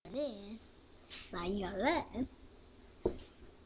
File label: violin